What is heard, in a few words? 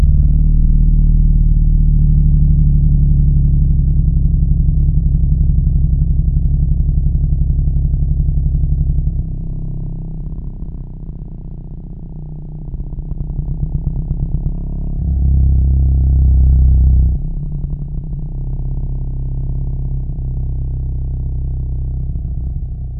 Humpback whale larynx; Acceleration